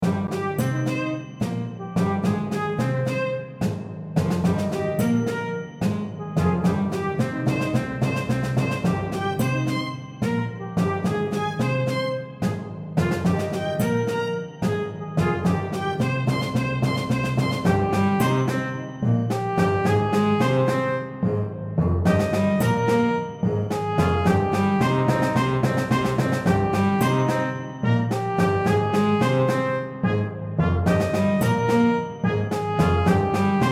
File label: Orchestra